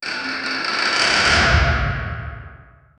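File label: heavy medieval wooden door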